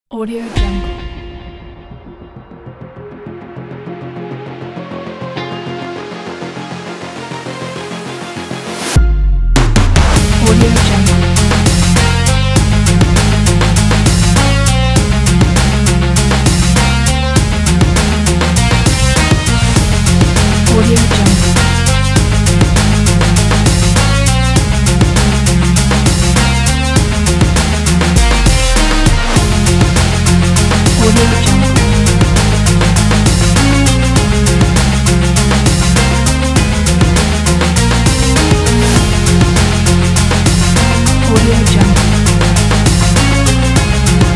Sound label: epic achivement rock